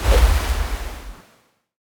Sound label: water sword Buff; Combat